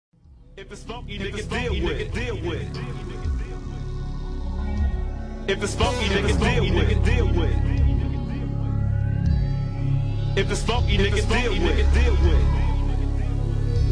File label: funk